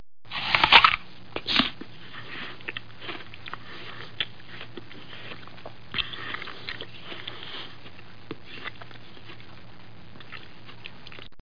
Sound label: bite